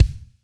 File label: marimba drum; ゆめ2っき